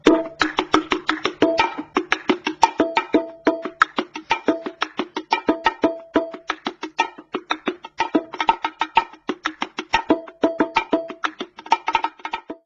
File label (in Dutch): bongos